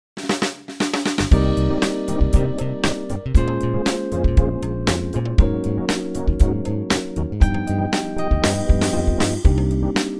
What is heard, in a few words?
Cm to Em smooth